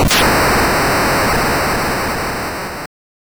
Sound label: missile, 8bit; カタナ１